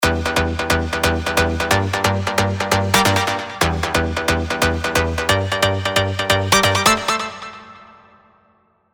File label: rave stabs; Street Culture; revealed spire rave stabs